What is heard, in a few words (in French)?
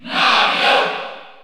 Cheer French; Mario; SSBU